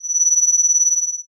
shiin

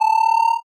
purupuru

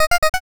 punpun

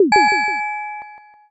shishiodoshi

